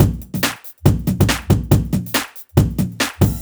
Break Beats, 140 BPM; Loops 140 BPM; Vengeance - Essential Clubsounds Vol.4

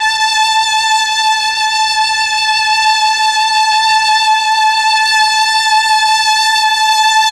MEL STR, Legendary M400; ILIO; Propeller Island

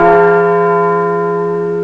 churchbell; Amiga Soundtracker Sample Packs

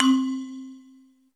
POLE BOTTLE, TONAL PERCS; Crypton - DrumBase5000

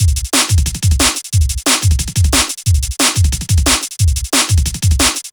Future Beat, Drum & Bass; Fluid Dynamics

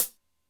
Cym Power Hat, Drum Machines Alesis D4

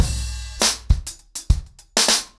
Drumkit 100BPM, ACOUSTIC DRUMS; Sonokinetic - The Roots Drumloops

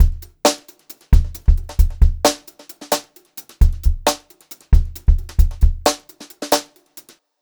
Brushed Drum Loops; USB Soundscan vol.39; AKAI; 133 HOTROD

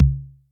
Electric Tom, Yamaha DTXpress; 300 Drum Machines

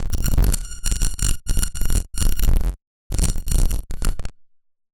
Casio, Glitched, malfunctioning synth

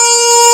ORGAN, Fairlight CMI, ANALOG

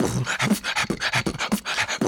120 Puppy Ratchet, World Dance; Spectrasonics - Supreme Beats

